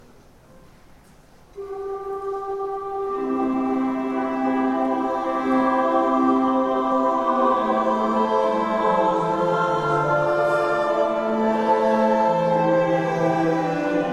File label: Adoramus te Christe (with organ; Lassus